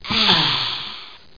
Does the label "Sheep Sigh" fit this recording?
Yes